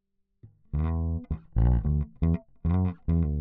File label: Bass